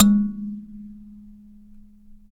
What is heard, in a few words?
Dyna Sansa; Roland LCDP11 Africa VOL-1; Sansas